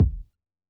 90s hiphop kick; Reference beat bpm90-A

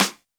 Hiphop snare; Dry original

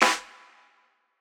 Jazz hop snare

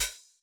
open; Electro; futurefunk

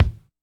kick, Acoustic

